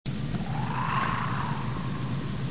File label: trap; Predator growls its surprise at discovering the trap.